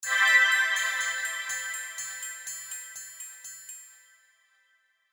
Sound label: faan